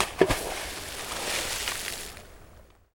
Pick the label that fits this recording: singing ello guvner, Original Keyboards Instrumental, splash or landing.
splash